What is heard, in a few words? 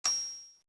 Tick